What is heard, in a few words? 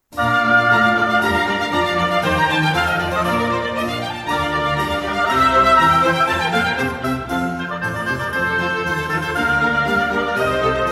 Instrumental; Llegada de la Reina Saba; G.F. Handel; Salida